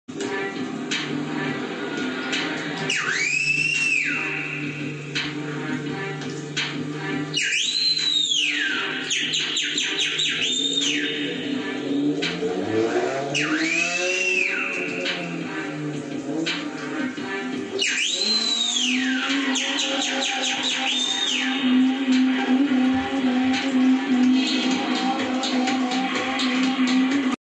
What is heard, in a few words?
remix bgm, english